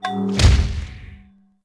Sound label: Pillage; Civ4 beyond sword